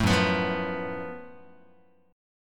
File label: F sharp Minor Major 7th Double Flat 5th, Guitar; strummed